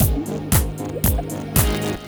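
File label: space disco, Loops; Distored Reality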